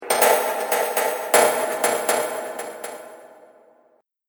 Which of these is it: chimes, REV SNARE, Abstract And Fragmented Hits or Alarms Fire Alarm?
Abstract And Fragmented Hits